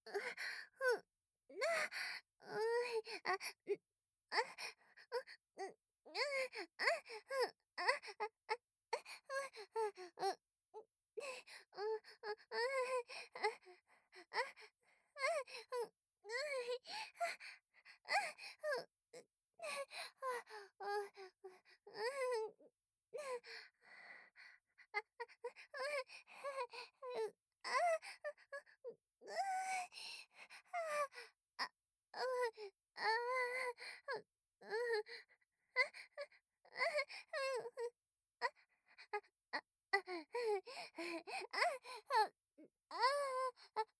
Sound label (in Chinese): 娇喘声 普通